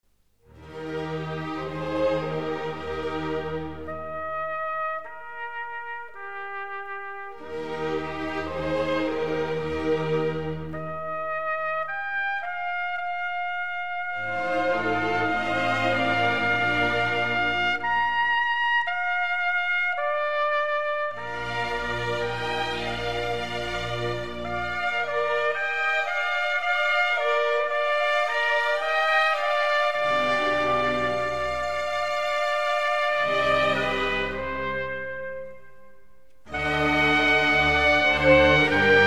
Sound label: Concerto for Trumpet, III, Trumpet; George Philipp Telemann